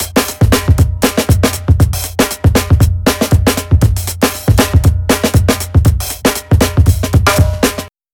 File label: Drum loop clean; LANDR Medium